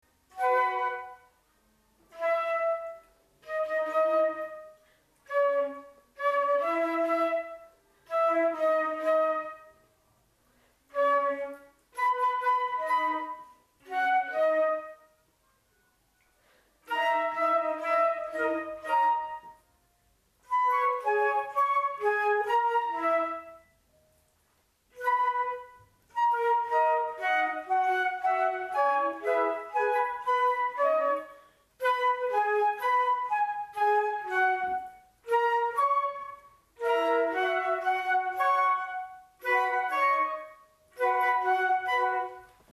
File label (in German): Musik mit rhytmusveränderung; astro